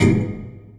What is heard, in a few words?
Vinyl Oneshot; Sonic Foundry (Sony Creative Software); Esoterik Beatz; Vinyl101